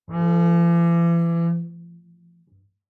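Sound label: Bass arco fortissimo sul D E3, Double Bass; MIS Pitches - 2014; Strings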